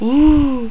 Oooh!

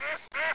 duck; fishing

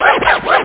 scratch, rat; OctaMED SoundStudio V1; jazzies samples

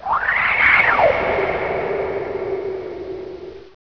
Spooky; WARLOCKSNDS

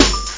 drum bit

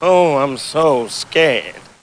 Scared; Demo Man

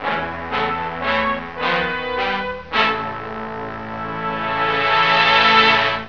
More Fun With Trumpets; Solarian II

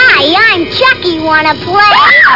Yucks; AirMailPro32

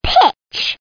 PITCH

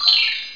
(C5) Bell Tree; 3D Ultra Pinball Demo; Pinball Demo Music

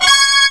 Herald's Horns; BabySmash 5.4

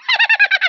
Laugh; BrazilPortugal

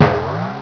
Bounce; PowerPete Demo; Jurassic; Barney Bounce

